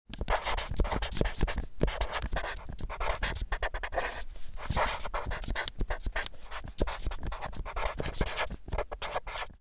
Permanent marker writing paper surface long

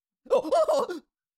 Cartoon little monster voice sigh surprise

